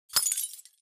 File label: imp bullet lightballistic solidglass debris